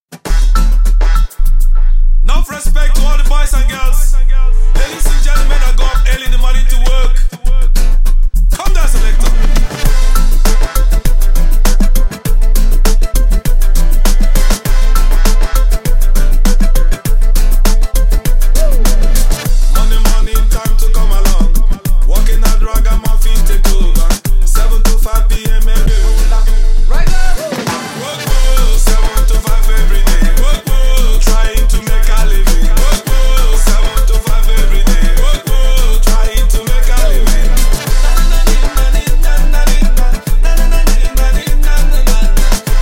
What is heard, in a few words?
low bass